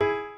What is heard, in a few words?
piano